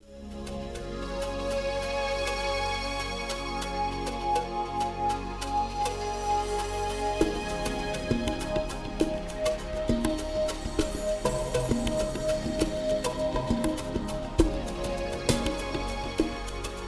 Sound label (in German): hauptteilloop ohne bass; Lernkurs MS Office 2019 Word 2019 Kompaktkurs